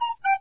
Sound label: minimize, windows 9x sound schemes; leonardo da vinci